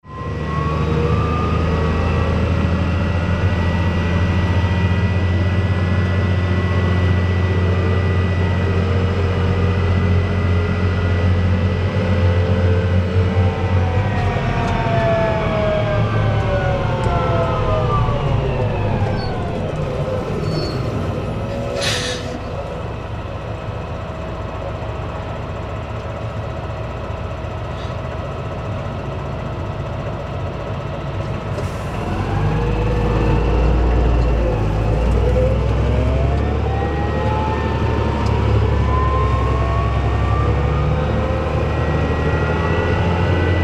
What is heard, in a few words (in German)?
Mitfahrt Fendt 936, Mitfahrt auf einem Fendt Vario 936, Motor: Deutz 6-Zylinder; Leistung: 360 PS; Hubraum: 7,142 Liter